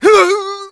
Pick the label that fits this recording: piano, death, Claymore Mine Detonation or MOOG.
death